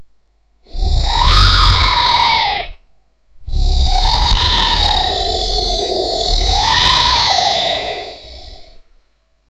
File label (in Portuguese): som agressivo e alto, dragon